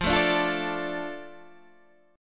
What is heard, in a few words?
D Minor F, chord